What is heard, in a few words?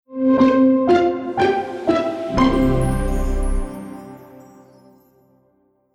jingle; audioflair gmbh